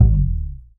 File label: TUB BASS; Sampleheads; Whole Lotta Country 1 & 2